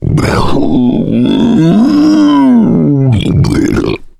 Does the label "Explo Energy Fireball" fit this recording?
No